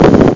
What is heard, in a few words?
BOMB